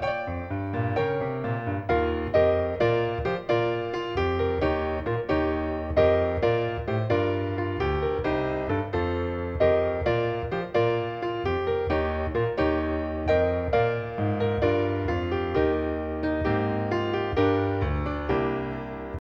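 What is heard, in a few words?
accompaniment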